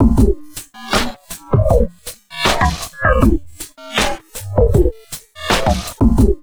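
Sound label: drum loops crazy processed, 80 bpm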